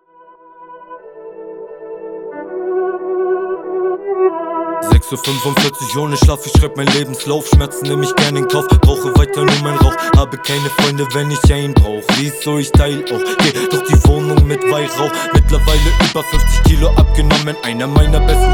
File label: Beat